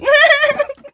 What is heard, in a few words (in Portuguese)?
risadinha de bandido